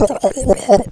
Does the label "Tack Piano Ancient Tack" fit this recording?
No